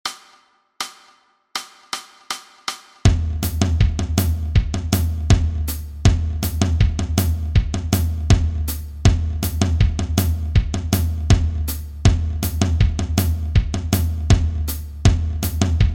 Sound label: Drum Sample